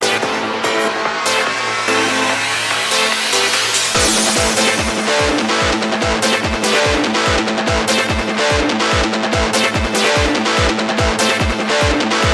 Guitar Rock

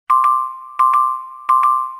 chat notify; ringtones; sms

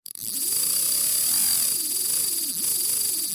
fishing reel

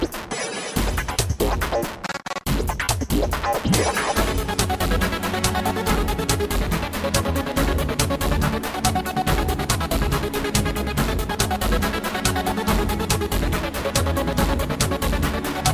Super Music Fast

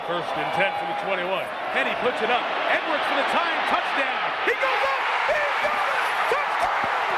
Is Calling Suspense

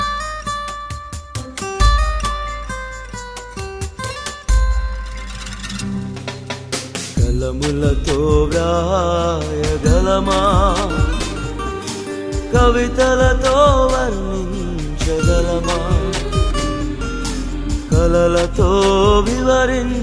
telugu christian

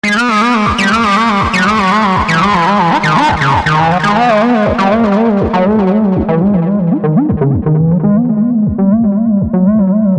303 acid

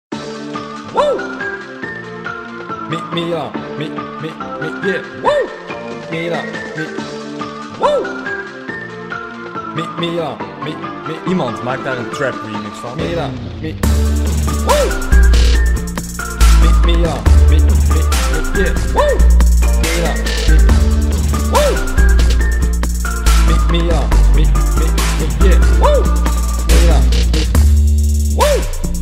Trap Remix

